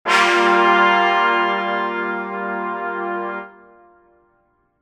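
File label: env trumpet decay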